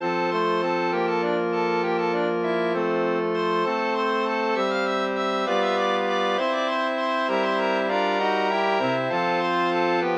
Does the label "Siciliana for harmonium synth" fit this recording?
Yes